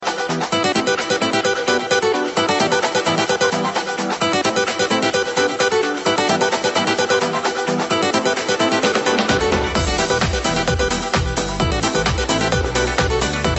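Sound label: message spanish guitar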